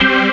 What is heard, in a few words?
ORG, DANCE ORGAN